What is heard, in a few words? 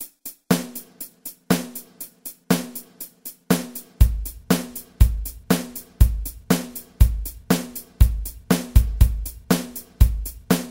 8 beat basic